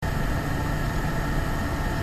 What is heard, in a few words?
fan